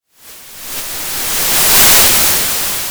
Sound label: Sound of waves crashing on a shore